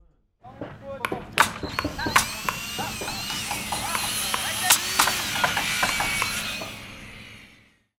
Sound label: emergency repairs; cardboard kaiju